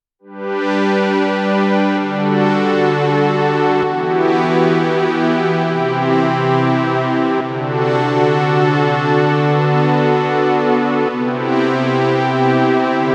modal electronics cobalt5s

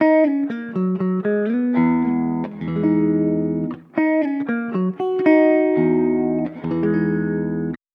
guitar chorus dimension d smooth guitar; Arturia; Arturia FX Collection 2 Test

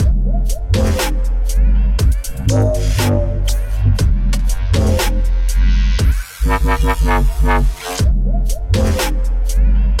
reality dub; ueberschall dubstep skillz

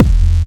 Kick

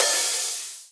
Open HH

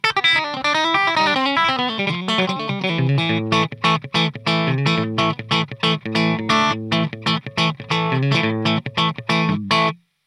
TAD Blackface 22 reverb

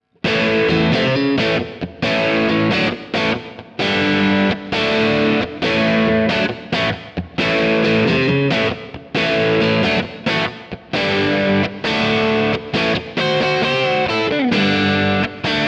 uafx golden reverberator

